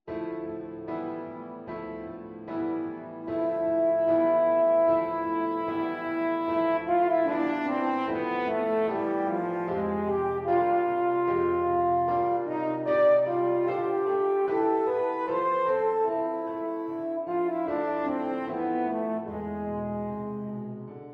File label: Meditation for horn and piano; Meditation for horn and piano is a short piece in reflective mood. It opens with a soothing, rocking